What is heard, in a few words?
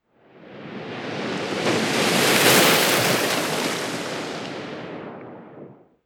Waves Up Down